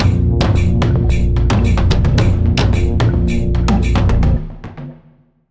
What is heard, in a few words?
basicMood Cueva Bosque